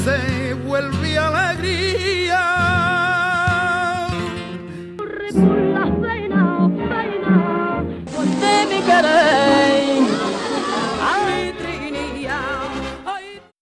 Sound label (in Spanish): Cambio de vocales